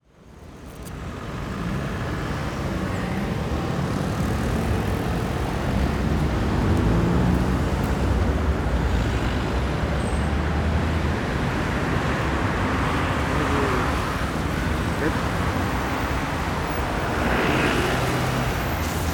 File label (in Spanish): AMB EXT DIA CIUDAD Bs As ARG Generico transito sobre avenida